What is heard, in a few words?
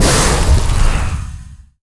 ice activate; smite; god merlin